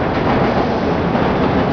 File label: train